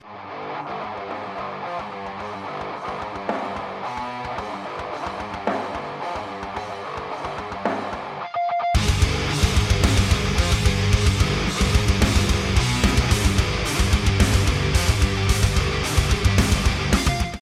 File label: Nokia Lo fi